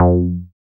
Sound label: Bass SH-101